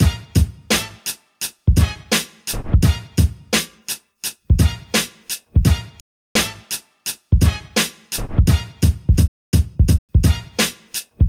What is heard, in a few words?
Outro Full Instrumental; Filthy Frank BG Music